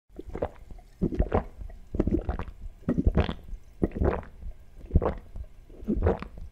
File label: qolop qolop; قلوپ قلوپ